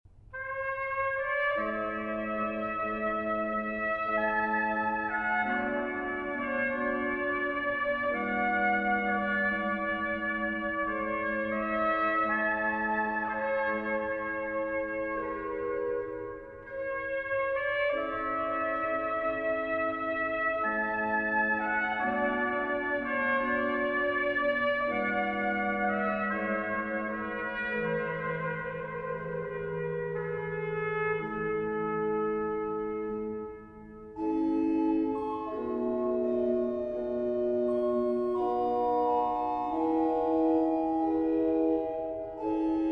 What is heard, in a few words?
D maj; Tartini